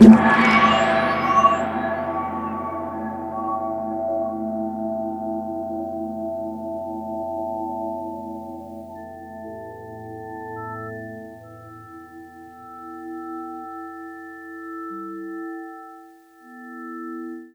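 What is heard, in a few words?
METAL GONG left; USB Soundscan vol.33; AKAI; Disjointed Textures; DRUMATIO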